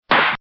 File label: snap sound; Roblox